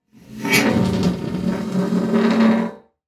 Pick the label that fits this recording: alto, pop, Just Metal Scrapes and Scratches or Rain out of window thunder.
Just Metal Scrapes and Scratches